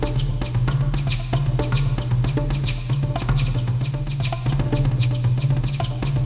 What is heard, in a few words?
DRUM; CIV2